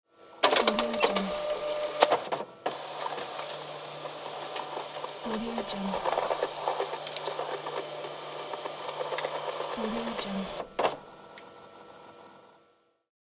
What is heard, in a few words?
fax machine receiving fax; wistansound